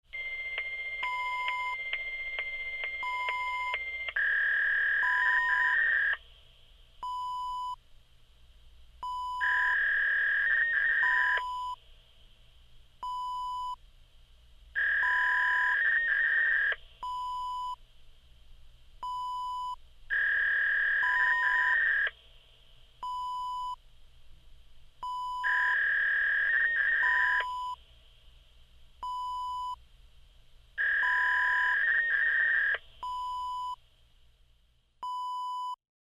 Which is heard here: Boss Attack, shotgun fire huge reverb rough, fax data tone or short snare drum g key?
fax data tone